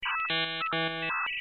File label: internet sound